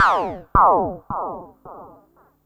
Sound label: Perc Synth Moog Delay